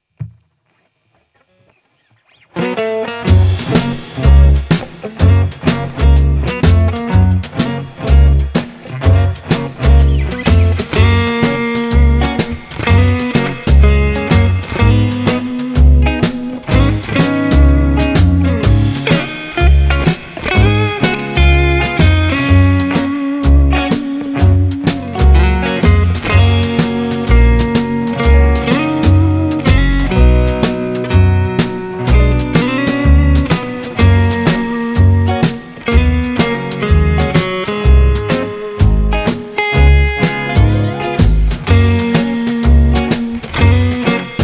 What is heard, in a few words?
Xmas Instrumental